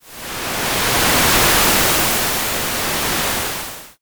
wave

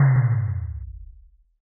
impact text

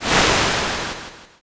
swoosh

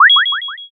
unlock

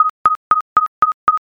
railroad

